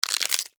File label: Insect Eat Attack, Insect, Eat, Attack, Juicy, Wet, Bite, Bug; Sound Effects:Animals:Insects; Insect Attacks; Blastwave